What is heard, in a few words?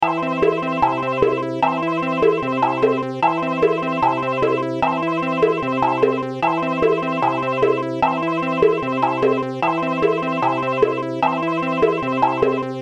Ring Ring; Five Nights at Impostortronics